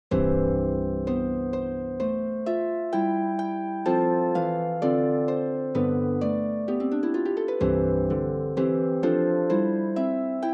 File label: Popular Folk Songs for the Harp, Early one Morning